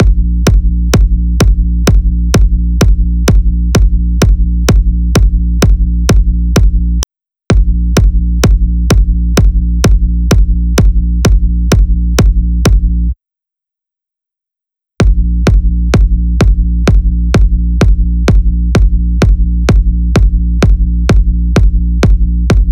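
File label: Drum Loop bass drum A#, 128BPM; VEC5 Drumloops; VEC5 Multi Loops 128BPM; Loop Elements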